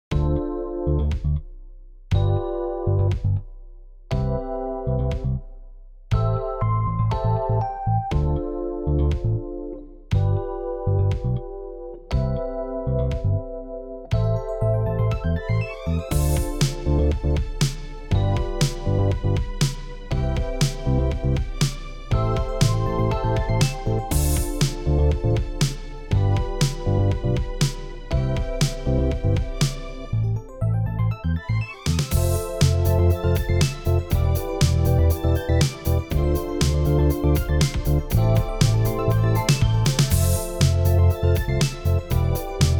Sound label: added strings